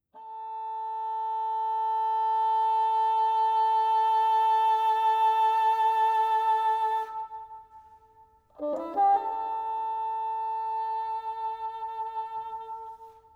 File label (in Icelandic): Fagott sónata; sónata 2 kafli bútur